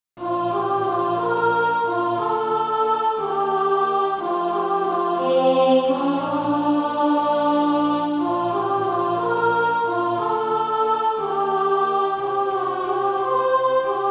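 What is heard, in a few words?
sop, Practise Music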